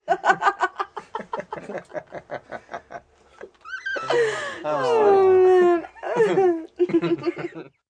Group Laugh Light, Laughing, group of 3-5 (30-something) friends, light laughter (human voice); VoiceBox